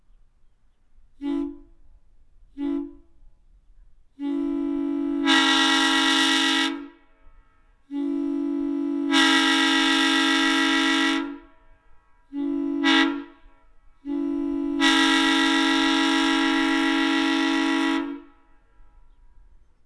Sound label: Nathan M3, Horn